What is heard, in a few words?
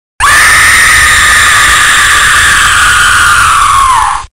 scary scream horror sounds creepy sounds